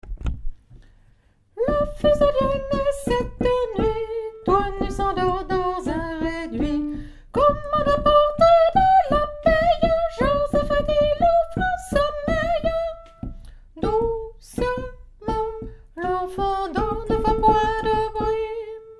l'enfant dort soprano; nouveaux noels; chants noel

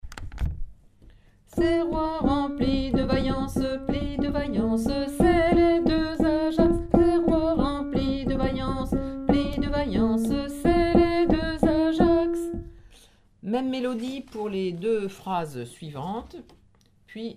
belle helene alto

